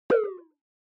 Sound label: Onoma Sigh Dry